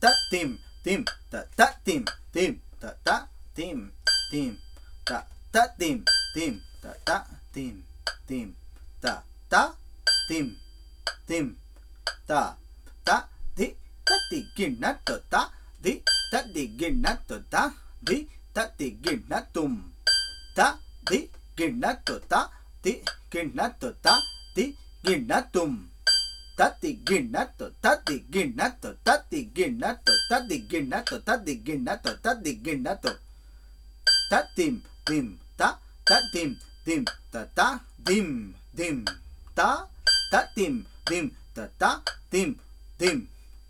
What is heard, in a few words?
mukthay combination tala